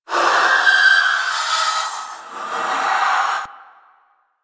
Minion Vocal; Warframe; Stalker Acolyte Minion

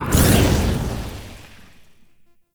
Void Beacon Activate; Warframe; Missions; Zariman; Zariman Assassinate

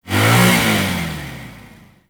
Infested Hammer Rev, Weapons

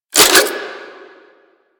Spin B; Warframe; Zylok Prime

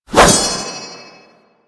Sword Swipe; Warframe; Duviri; Duviri Dax; Weapons

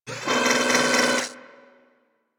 Foundry Motor Backward; Warframe; Liset; Hub; Machines; Tenno Foundry

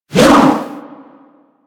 Hammer Weapon Launch; Hammer Boss

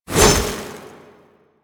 Hover Whizzby Angry; Enemies; Warframe; Warframes; Pax Duviricus

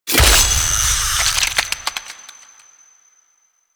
Fishing Spear Fish Caught; Warframe; Weapons; Eidolon Fishing Spears

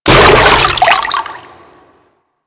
Frame Step Water; Enemies; DE; Warframe; Vay Hek

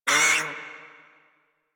Feral Vocal Pain; Warframe; Catbrow

